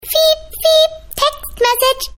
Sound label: Mousy Fiiiep Text Messag